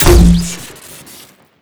proxy bomb launcher shoot crit

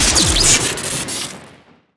tesla shocker shoot crit

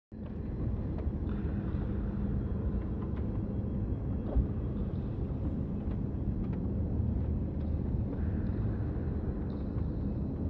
spooky ambience

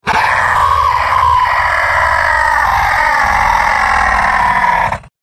soldier death

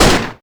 ak47 fire

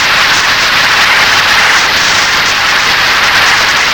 laser related attack

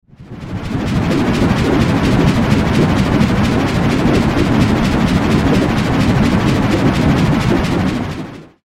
shocks spin; waters regime; major

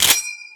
shotgun cock forward

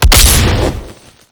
meathook fire, weapons

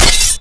ubersaw hit, weapons

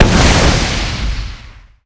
mortar fire; mercmortar

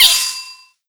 tnt armed

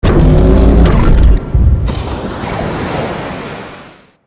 waterstep; termination; goliath

